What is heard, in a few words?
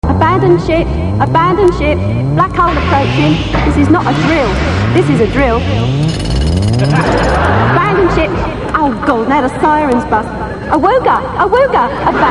alert; RedDwarf